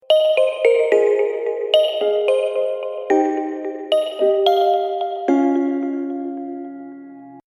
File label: SMS Adrift Space